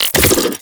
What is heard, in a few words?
Rifle Reload; Warframe; Sentient; Sent Rifle New War